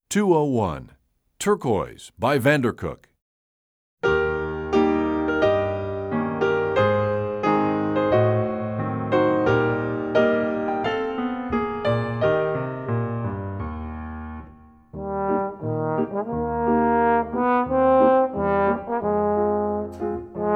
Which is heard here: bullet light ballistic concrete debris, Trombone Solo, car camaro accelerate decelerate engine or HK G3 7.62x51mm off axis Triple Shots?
Trombone Solo